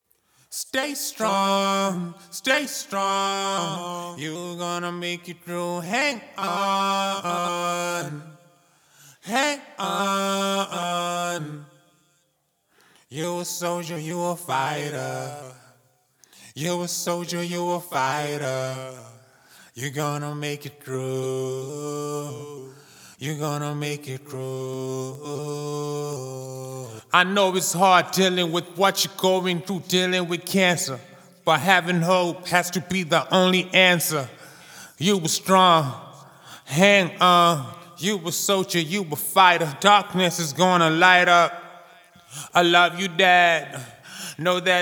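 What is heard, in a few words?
hip hop rap sing 70 G minor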